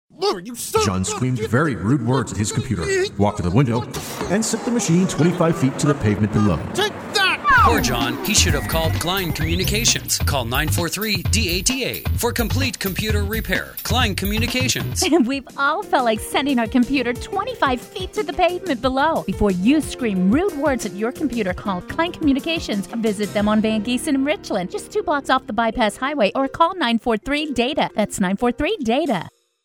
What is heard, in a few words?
Cartoon Computer